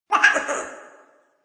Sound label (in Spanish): risa pequeño diablo